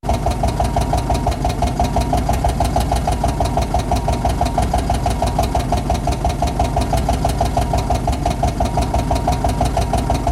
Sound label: Airbound Lifters, Engine Sounds